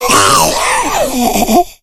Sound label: smoker death; voice; Left 4 Dead 2